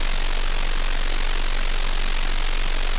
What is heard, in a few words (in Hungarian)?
feherzaj modulalva gyorsan